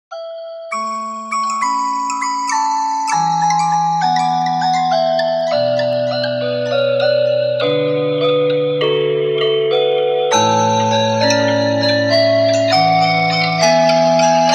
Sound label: Music Box Flow; soniccouture flowstate; Kontakt